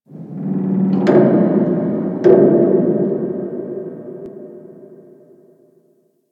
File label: Infested Distant Metal Creak, Ambience; Warframe; Corpus Infested